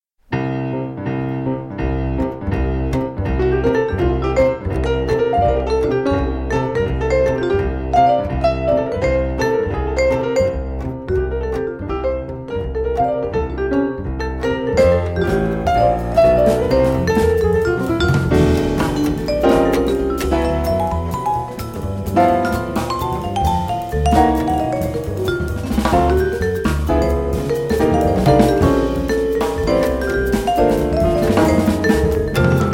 quartet

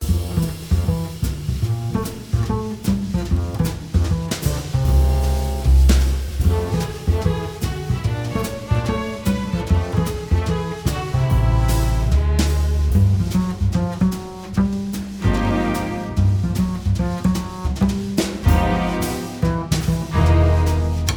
sketches for bass orchestra

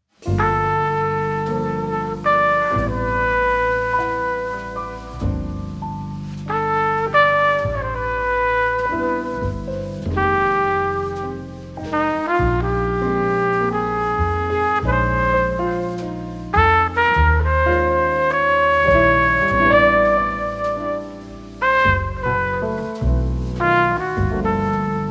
ballad

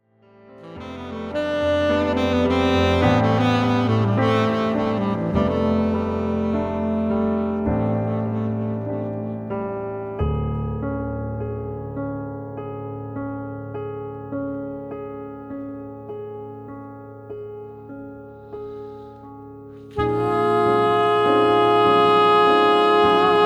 trio

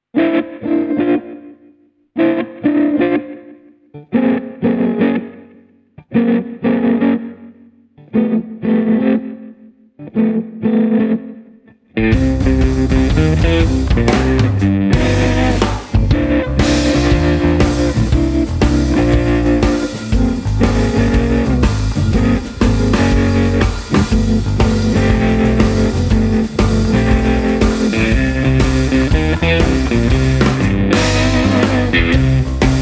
shuffle

